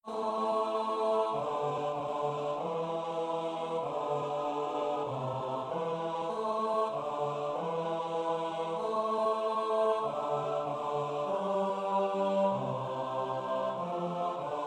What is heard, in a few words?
Psalm bass